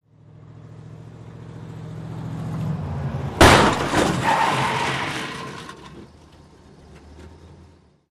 Drop Impact Moving Vehicle Collides With Stationary Vehicle, L To R